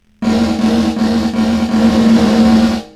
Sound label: REFLECTIONS Rich Nonlin Proximity Distant